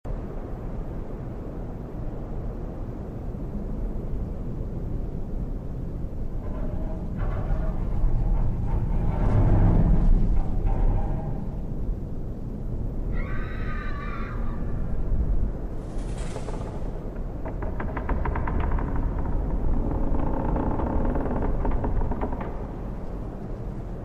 zr ambience

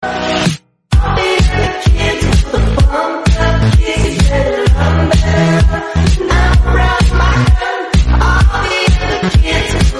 kicks amby

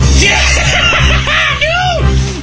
yeah eh eh e

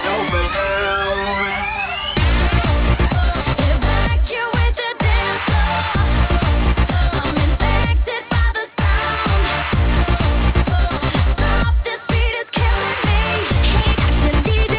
music

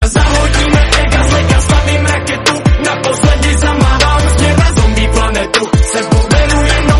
zombie

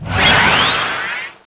powerup; kronos

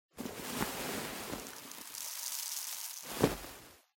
emote salt foley; fortnite emotes

